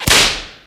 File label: rubber glove snap; Source Engine; tf